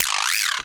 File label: Vibraslap; musicradar; cartoon caper